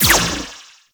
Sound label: Choir Erupt Firing Eye